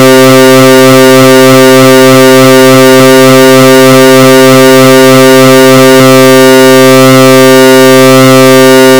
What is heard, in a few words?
power chords